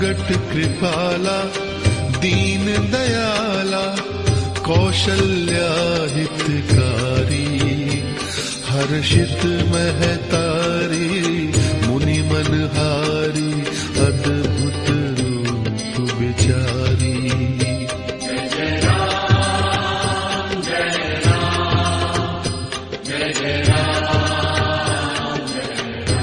Krishna Bhajan Ringtone

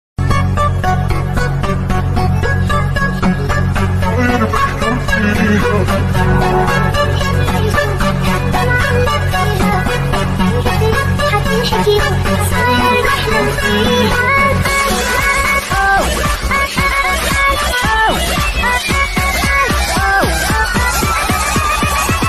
Hollywood Music Ringtone

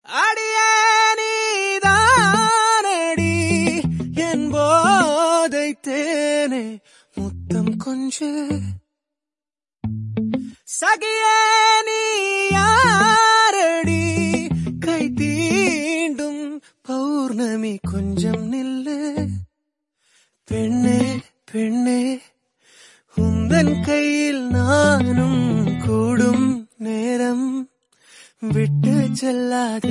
Telugu Song Ringtone

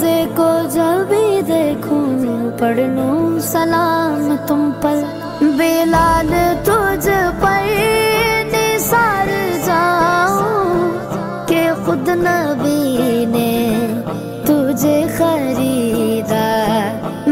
Naat Ringtone

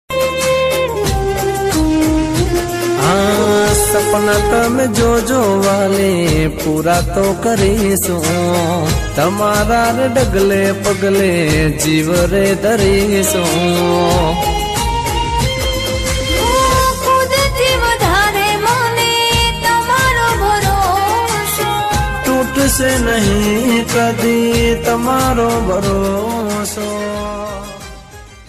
Gujarati Song Ringtone

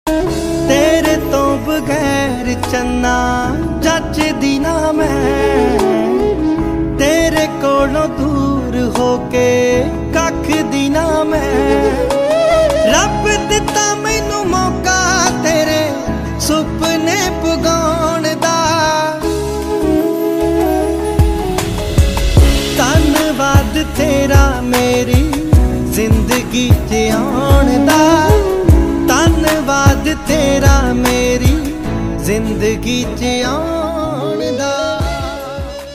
Punjabi Ringtone

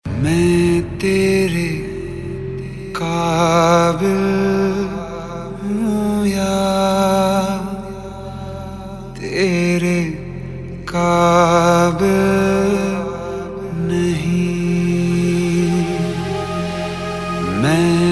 Sad Hindi Ringtone; ringtones

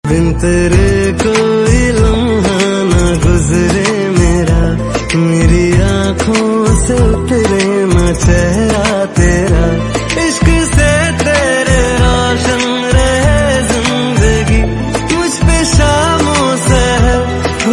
Hindi ringtone

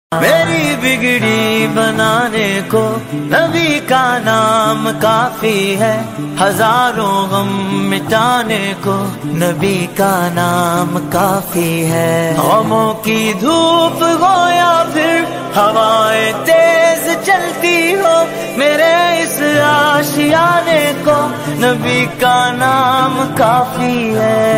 Naat Ringtone

